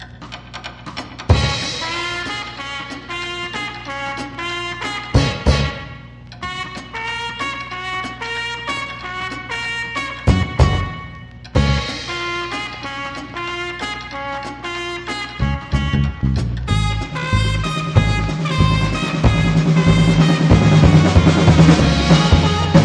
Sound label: Instrumental